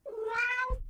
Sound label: animals cat meow; mono; 96kHz